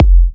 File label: F, kicks; markus hakala kicks